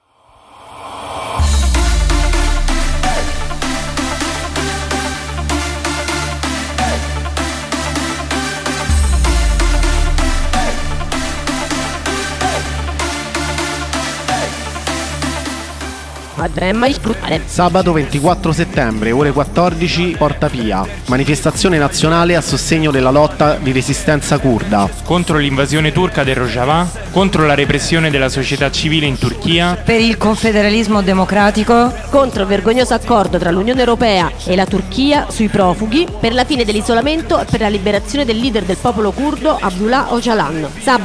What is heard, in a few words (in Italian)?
manifestazione kurdistan